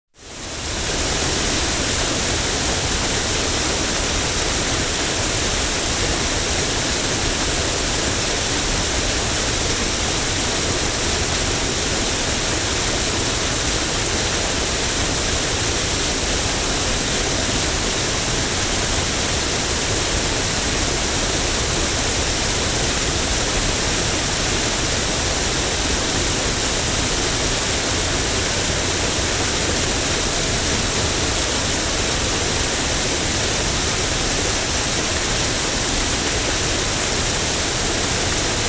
Grande fontaine; bruitage; Liquides

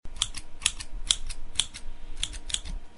Ciseaux coiffeur; bruitage; Electro-menager

